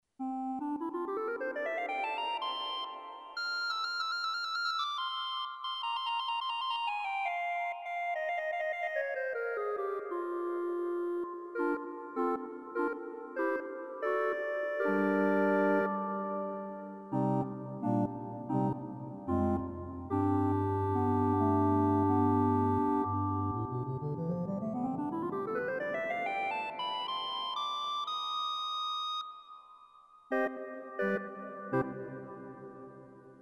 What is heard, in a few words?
kvintadena 8, organ